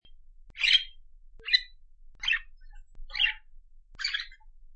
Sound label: budgerigar chirping